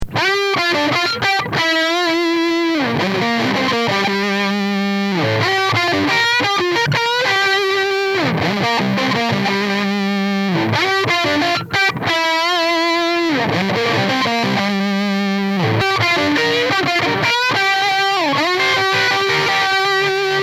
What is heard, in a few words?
surfin lead; lampes; 12u7